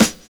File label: DISCO SD, SNAR; USB Soundscan vol.11; AKAI